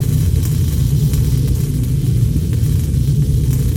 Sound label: Dojo Lightning, ambience; Ninjago